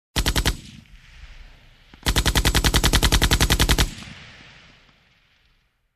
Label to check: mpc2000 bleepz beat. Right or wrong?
Wrong